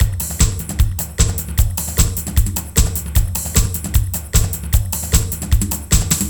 house, loops 140 bpm; NICE HOUSE; Masterbits - Tekkno Trax

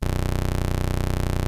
Prophet 5 B1, Synthesizer; Hollywood Edge - Giorgio Moroder Rare Synthesizer Collection

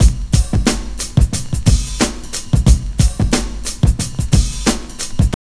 TRIP 90, trip loop

